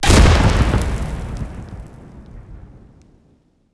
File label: Sfx Rubble Impact; Minecraft: Story Mode; Colossus